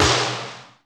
SWISSIN-SD, drum; Crypton - DrumBase5000

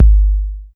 Kick, Alesis DM5

